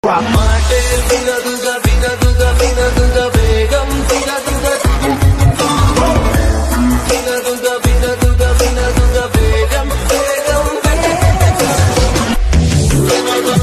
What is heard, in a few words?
remix ringtone